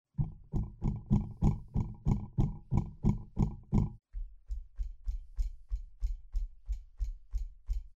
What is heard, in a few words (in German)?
Beyer MKV8 AKG H100 Beispiel; Shockmounts